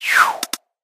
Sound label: swoosh drop